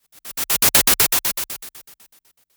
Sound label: Strobe Transition